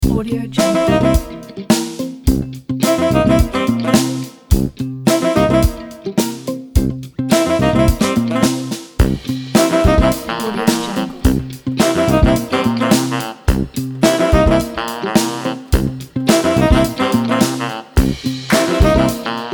funky backgrounds pack

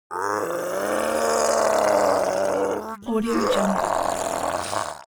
creature zombie human change; smartsoundfx

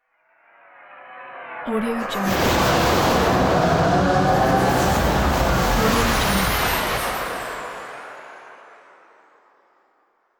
alarm glitch logo

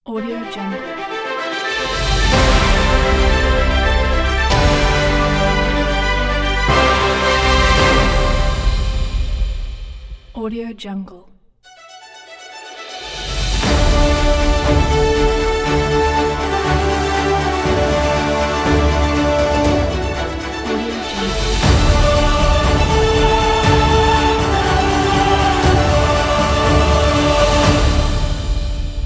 awards winner logo pack

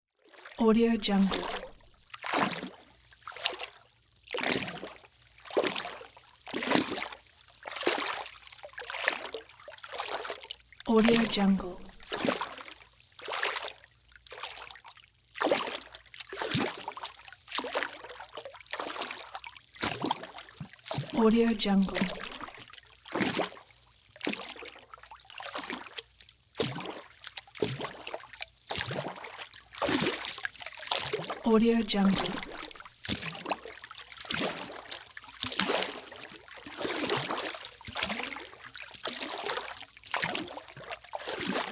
walking in water

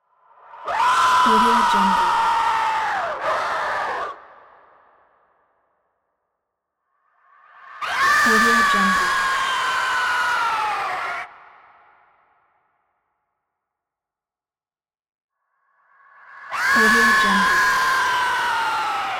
demonic scream

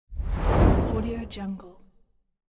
swoosh deep

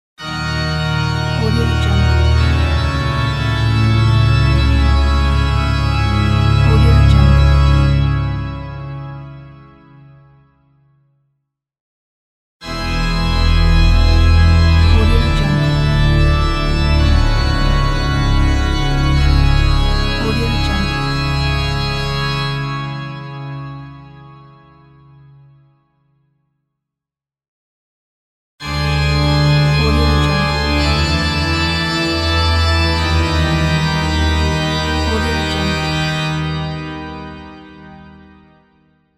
halloween organ

